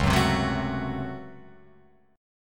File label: C sharp Major 7th Suspended 4th Sharp 5th C sharp, Guitar Open F; strummed; chord